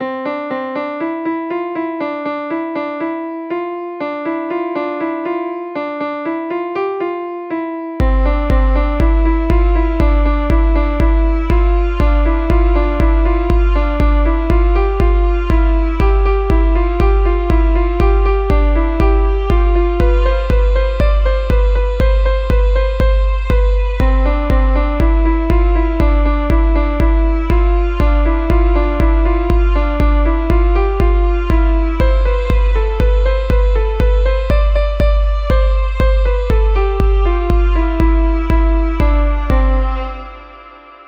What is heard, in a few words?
Calm Beats